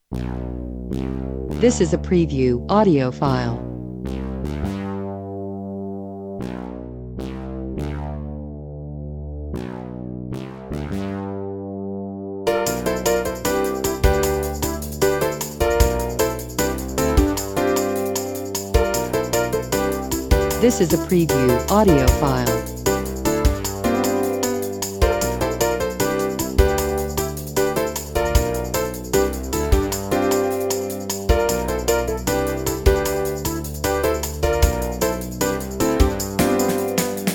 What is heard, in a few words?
Backing Track